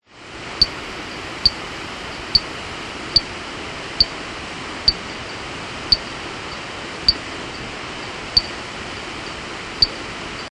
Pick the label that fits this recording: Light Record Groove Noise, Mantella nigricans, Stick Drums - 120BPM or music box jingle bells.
Mantella nigricans